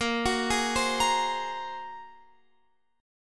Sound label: Bb 7th Suspended 2nd Sharp 5th Bb down Guitar Open F; Bb7sus2#5 arpeggiated